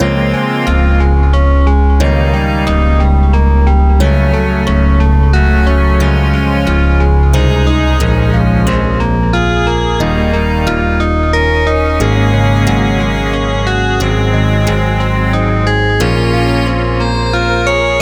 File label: Music Camp; battle axe